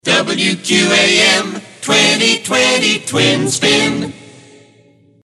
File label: Moog; WQAM; Gwinsound